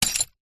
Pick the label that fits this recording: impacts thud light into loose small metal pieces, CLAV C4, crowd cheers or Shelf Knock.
impacts thud light into loose small metal pieces